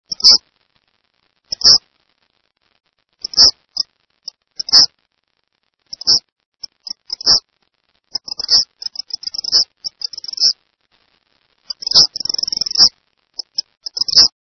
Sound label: Eastern Kingbird